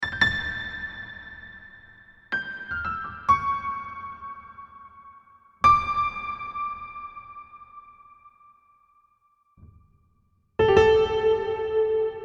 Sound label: Classic Hall wet; machfive